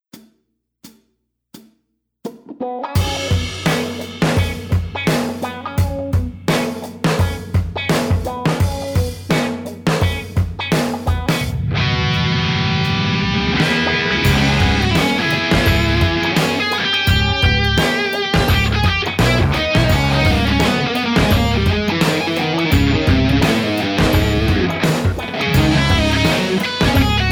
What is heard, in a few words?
Snapper test high gain; pedals-amps